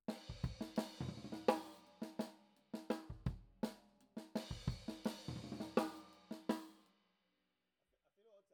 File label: KIT